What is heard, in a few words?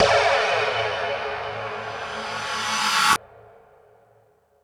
FX Sounds, Drum Machines